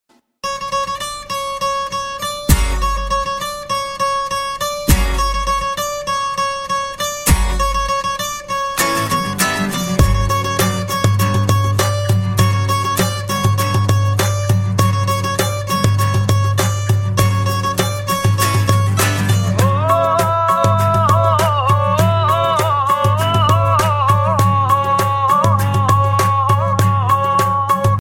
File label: funny ringtones punjabi ringtone